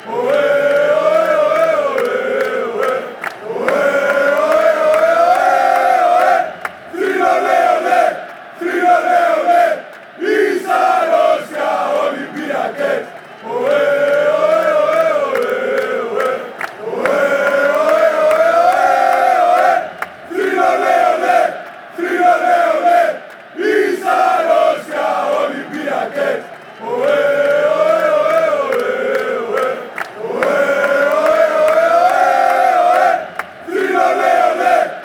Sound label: oh ayy oh ayy oh ayy, chants